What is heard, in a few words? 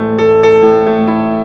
PIA, PIANOS; USB Soundscan vol.02; AKAI